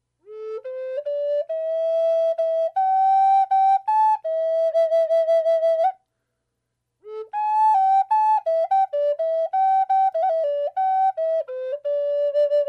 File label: flute; collector flutes